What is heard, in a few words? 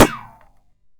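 Bullet hit ground; Hovl Studio; SFX Battle sounds; WhiteMan Unity2D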